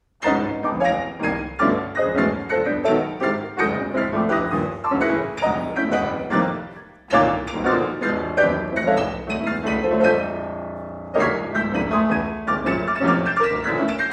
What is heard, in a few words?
piano roll blues